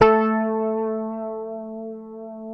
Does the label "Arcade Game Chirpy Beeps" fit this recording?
No